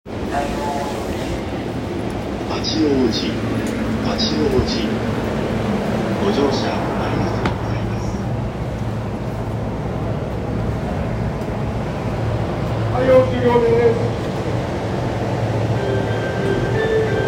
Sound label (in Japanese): 中央線快速八王子東, train sounds